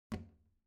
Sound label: object plastic trash can close soft; smartsoundfx